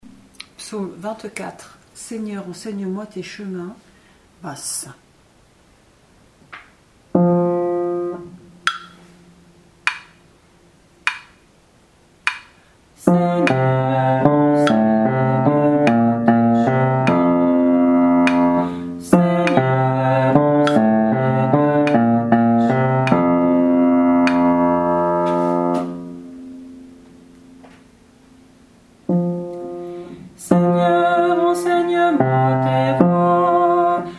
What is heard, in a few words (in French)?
Psaume 24 Seigneur enseigne moi tes chemins BASSE